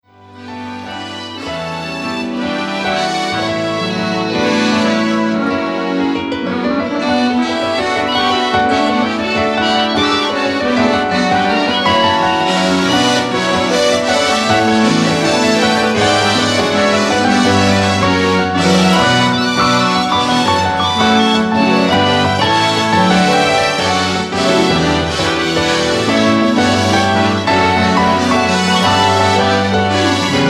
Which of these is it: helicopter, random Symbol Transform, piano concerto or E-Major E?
piano concerto